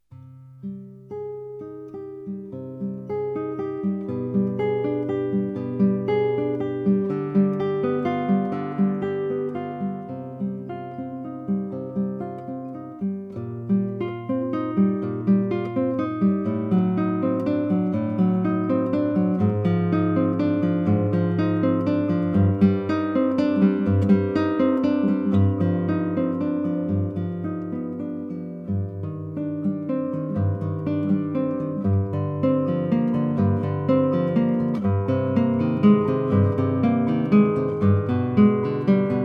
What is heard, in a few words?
Prelude in c minor